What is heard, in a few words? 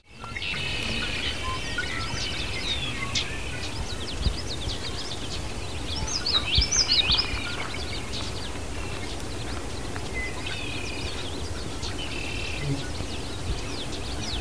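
Common Yellowthroat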